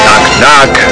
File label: knock knock